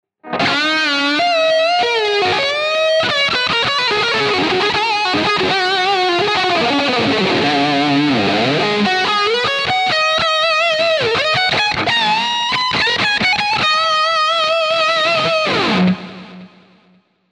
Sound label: Sterling Majesty X Bridge Humbucker Lead